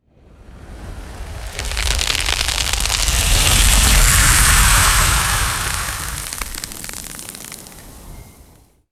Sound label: windy breath whoosh and ice crackle